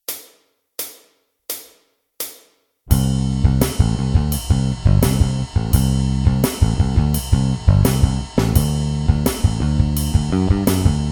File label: strum; fretjam